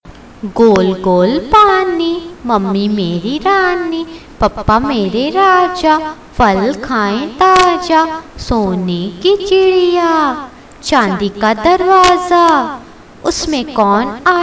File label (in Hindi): gol gol paani; हिंदी बाल-गीत - Hinđī Rhymes; गोल-गोल पानी - Gol gol pānī